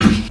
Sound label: Snare